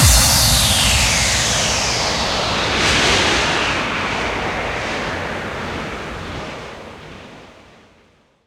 big Win Start; netent; butterflystaxx

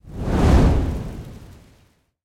fire Shoot; netent; magicportals

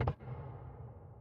reel Stop; netent; wishmasteroct

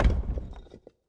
block Block; netent; pyramid

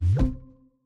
reel Bounce; netent; doublestacks

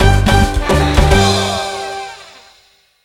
big Win Loop End; netent; narcos

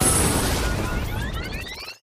big Win Txt Change; netent; whenpigsfly

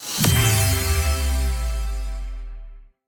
dazzle Scatter Hit 3; netent; fortunerangers

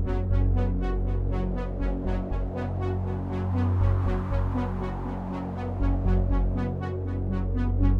fs Selection Music; netent; neonstaxx

